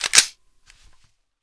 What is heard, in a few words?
sr25 bolt catch handle, sniper; weapons; garrysmod